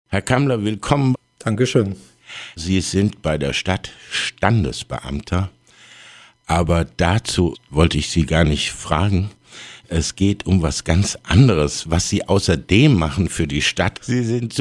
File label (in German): Glocken